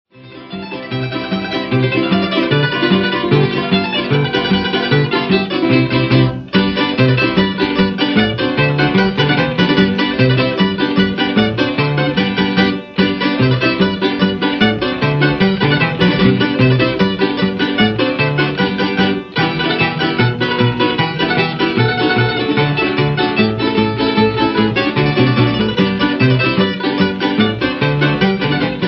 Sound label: Polka; Micika Polka; Arhoolie